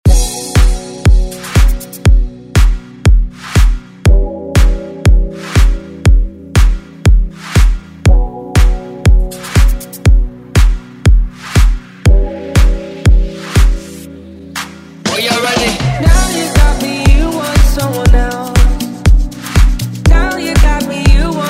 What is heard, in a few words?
House Redrum